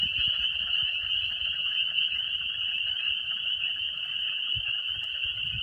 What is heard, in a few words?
peepers